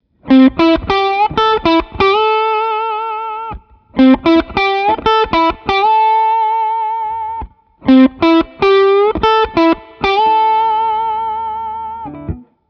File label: Pentatonic Lick Minor